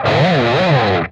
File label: PRS SLIDE, guitar